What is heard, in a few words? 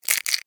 Insect Claw Crunch, Insect, Claw, Crunch, Snap, Movement, Bug; Insect Claws; Blastwave; Animals:Insects